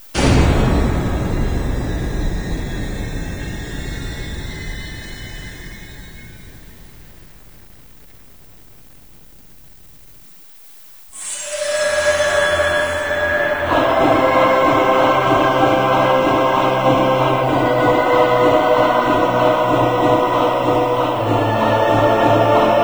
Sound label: scary; quake2; d3cyberdemon